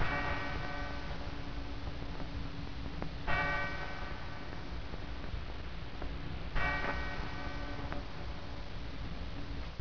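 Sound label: church bell; EFFECTS; CLOCKS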